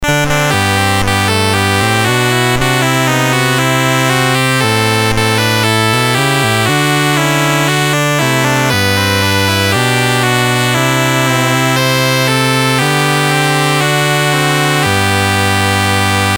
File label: MOS6581R3, GAMES; Who Killed Cock Robi BASIC; HVSC